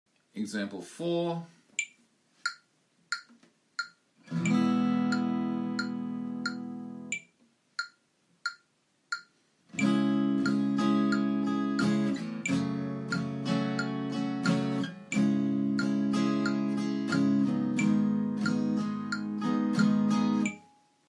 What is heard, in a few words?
Chords